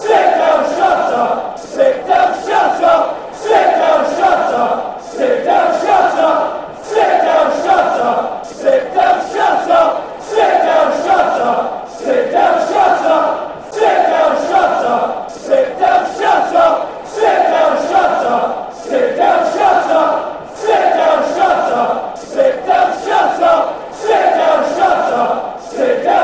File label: sit down shut Brighton, fanchants